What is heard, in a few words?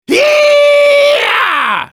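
battle cry, German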